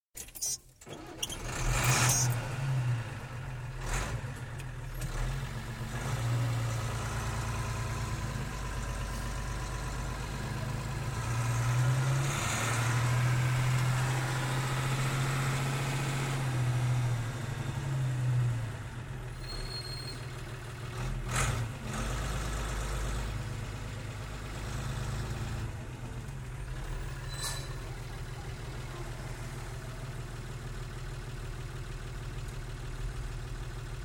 Lorry start and drive; Bedford; Truck; Vehicles; BBC

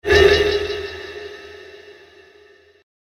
The Mysterious Swipe, whoosh; Whoosh Sound Effects; fantasy sound effect; role playing games, magic videogames

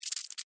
Insect Move Fast, Movement, Bug; Animal; BlastWave

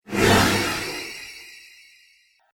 Ghostly Sparkles Paranormal Activity; Ghost Sound Effects

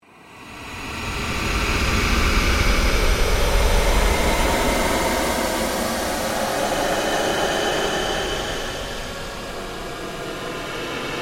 Ambient Sweep Very Slow, Drone; Sound effects created by manipulating other sounds; Sound Design; SoundEffects-Foundation1

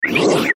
Slide Movement Multimedia; Blastwave

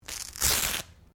Clothing Velcro Rip Long; Movemement sounds for various articles of clothing; Ripping Sound Effects; Ripping (Sound Effects:Objects)

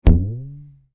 Cartoon Rubber Band Stretch Thwap; Miscellaneous Cartoon

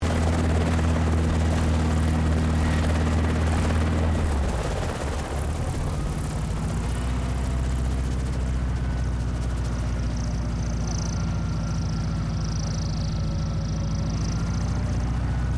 HELICOPTER 1982 ROBINSON R22 TAKE OFF; Helicopters; Vehicles; Aircraft Collection

